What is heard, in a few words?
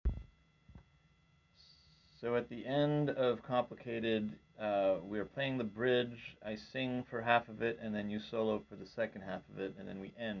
solo over outro